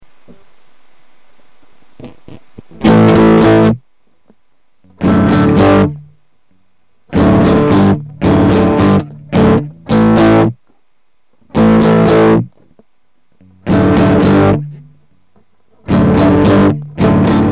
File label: Interfax Harmonics Percolator gain 0 no diods